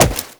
Wall Jump Default; Footsteps; WallRun; Warframe; Characters; Tenno

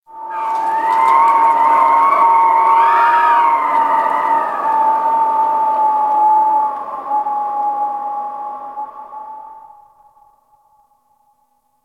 Ice Cold Wind Whistle

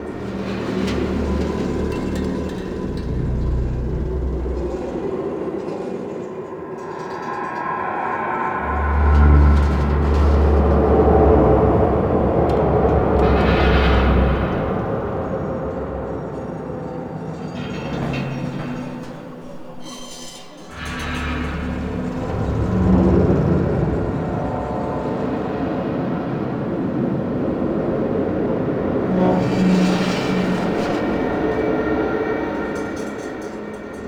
World Seeder Small Bed Loop; Tau; Interior

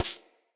Laser Impact Generic

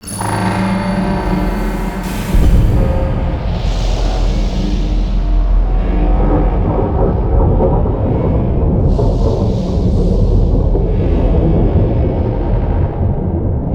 Ship Ascend No Fanfare; Ships

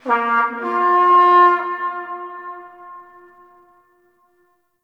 Battle Trumpet Horn Call; Warframe; Tau

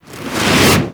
Environment Explosion; Gameplay; Warframe; The New War; Mission Four

